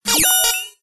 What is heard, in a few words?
science fiction computer burst beep tone